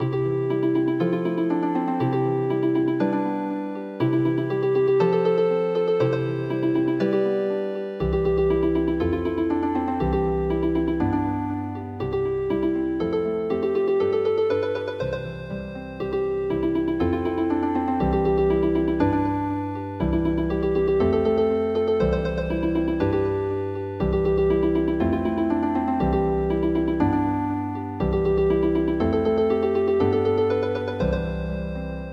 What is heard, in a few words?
Healing Harp